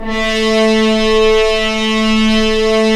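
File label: french horns, swells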